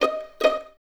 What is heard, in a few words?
Violins Pizz D#5; String Ensembles; Miroslav Vitous; 11 Violins